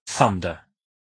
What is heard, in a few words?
thunder